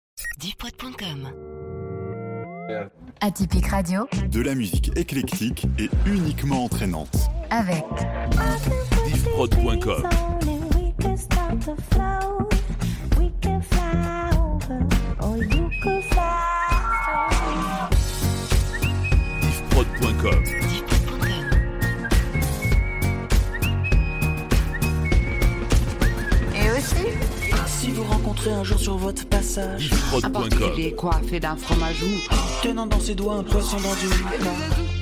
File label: Radio Medley Pop Rock